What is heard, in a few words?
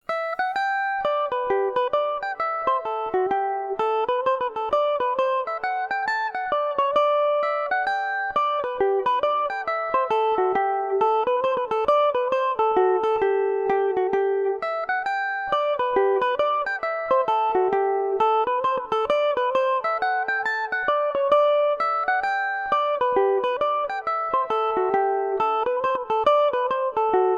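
G major revelation, Irish mandolin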